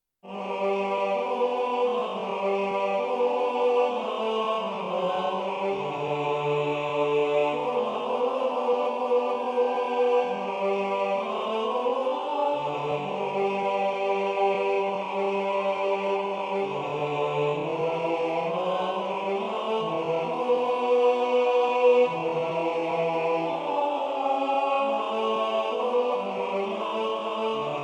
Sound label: canticorum basses; Chants; Canticorum Jubille